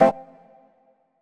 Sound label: Misc Synth Stab